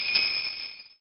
Jingle Christmas

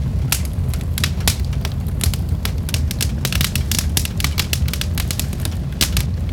ambience fire detail

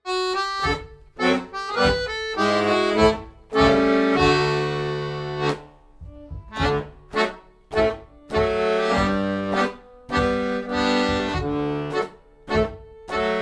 Accordion; Assassin's Creed Syndicate

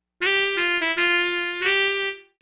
Sax

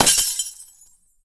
ice spell impact shatter

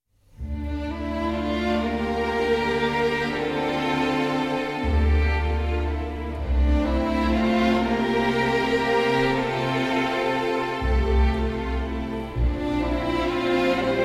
Howling Rain; Nobunaga no Yabou 14 Souzou

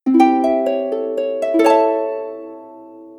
Unlock Item

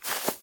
grass Walk

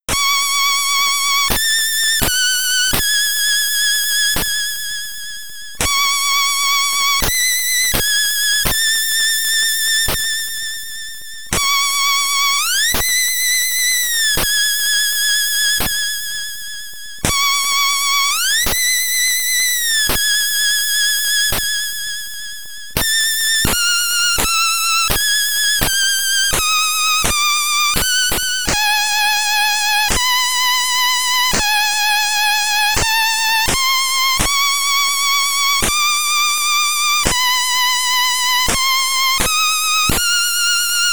Cave Theme, SID Stereo; Flash Gordon; Commodore 64

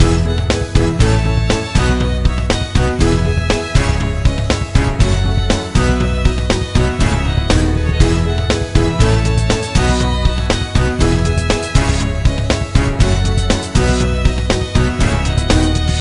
BGM Opening; puzzling masterpiece exploration 2009 nds gamerip

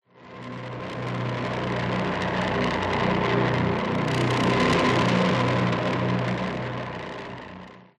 Music Devourer Noisier

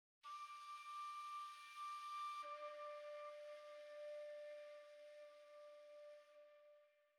Flute

